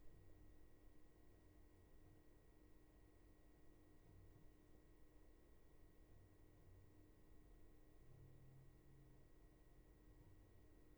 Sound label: roomtone